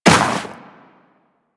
Walk Snow, Footsteps; DE; Warframe; Tenno

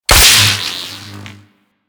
Beam Hit Effect; Warframe; Captain Vor

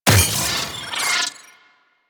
Drone Death Malfunction; Warframe; Venus; Venus Terra Attack Drone

